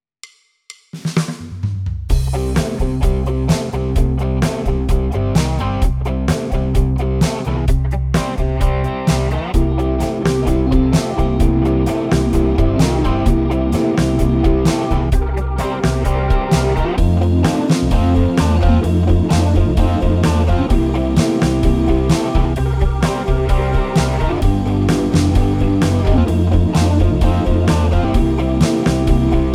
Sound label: Rock; Giglad